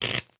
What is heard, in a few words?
Cable; Cherricar